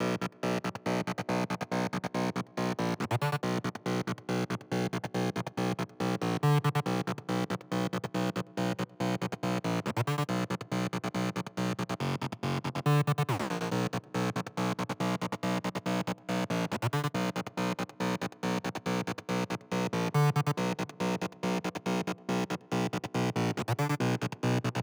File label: Synth; ODGPROD